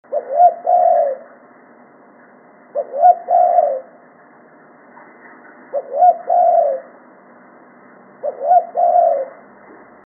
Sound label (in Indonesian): suara tekukur